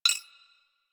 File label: comp beep; Warframe; Hub; Tenno Hub